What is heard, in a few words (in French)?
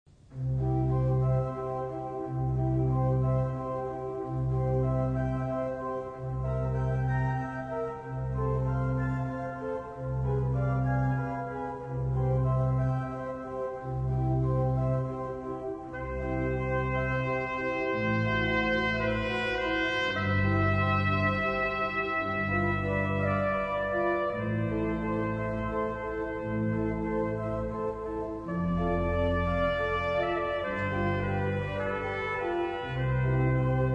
Trompette